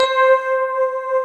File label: SYN K-2000, Roland